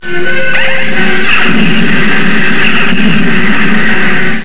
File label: phaser